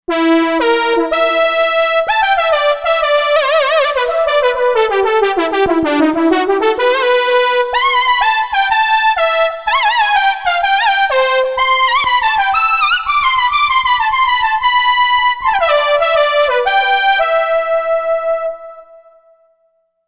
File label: Lead Pro Lead